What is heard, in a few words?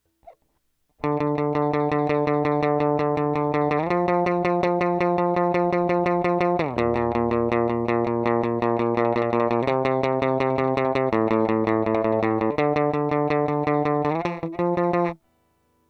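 guitar distortion; gtr dist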